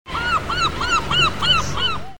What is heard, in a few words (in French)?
Mouette, Animaux; bruitage